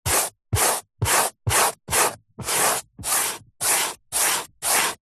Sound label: chalk rub erase hand finger short strokes chalkboard; office